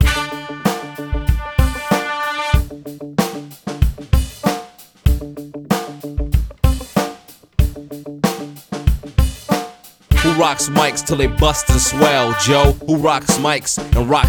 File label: 80s hip hop